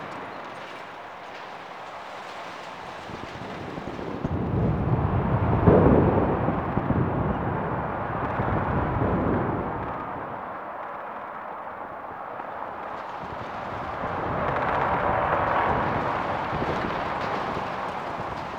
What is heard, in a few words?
Exterior Amb Bed; Tau